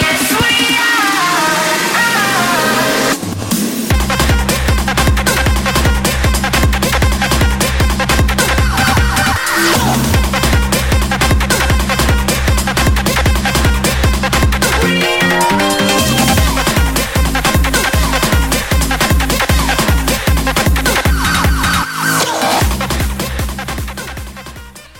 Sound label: Reload Club Rtone